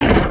Run, creatures organic

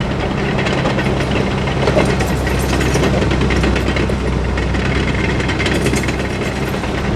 construction amb; ambience avant gardens

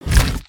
walk; creatures organic

